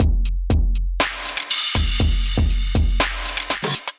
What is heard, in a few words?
Crackin Kit, Drums Hybrid; Ableton; Factory Packs; Drum Essentials